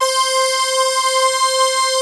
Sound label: basses; Wizoo - Powered Wave